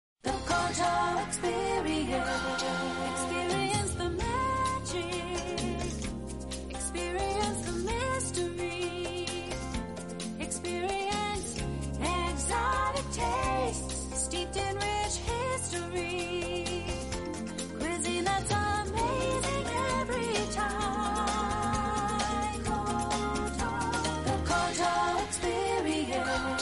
koto experience

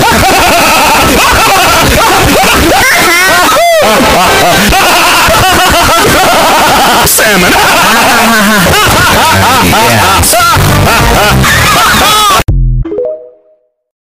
laughing

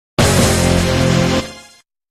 dun dun; mad dummy

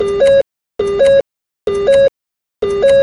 atm beep sound

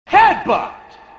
headbutt attack

